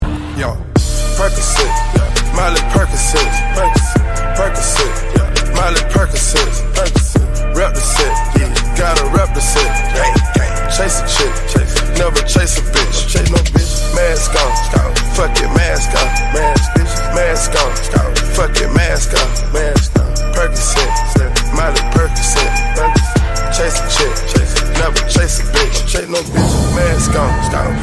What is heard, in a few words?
Remix English